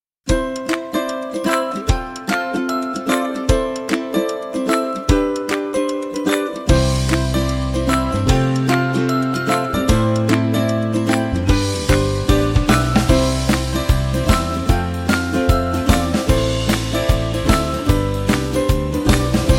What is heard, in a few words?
Upbeat Ukulele